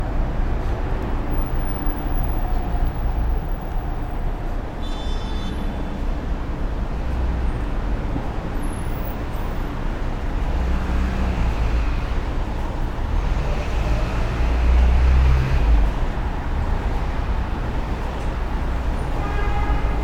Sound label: intersection